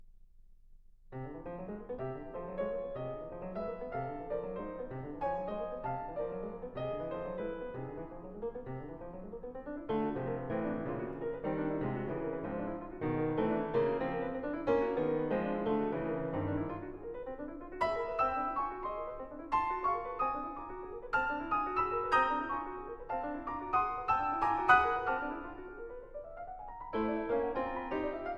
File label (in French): etudes pour piano; fanfares